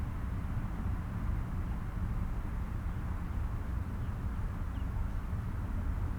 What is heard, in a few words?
morning outside; Golden Crayon Sounds